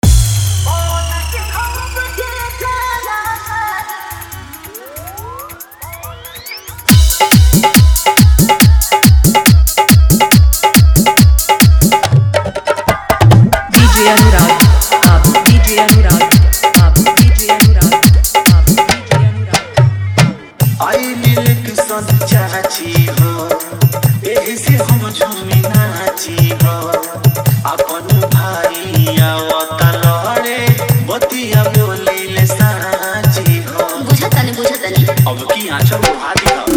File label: Dhollki Bass Dj Mix; Chunav Prachar Geet